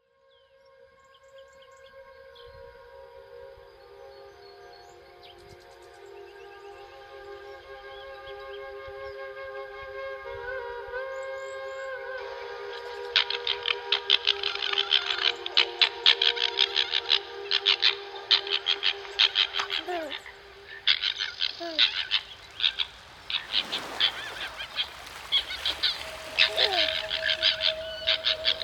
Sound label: soundscape